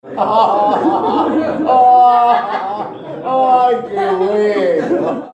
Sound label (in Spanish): hahah aaaa hahaa aaaay que bueno